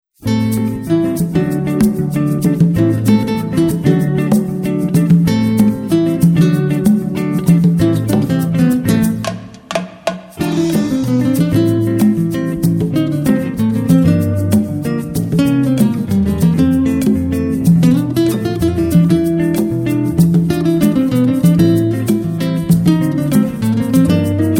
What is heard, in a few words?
bossa